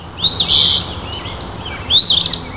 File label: phoebe call; natureNavigator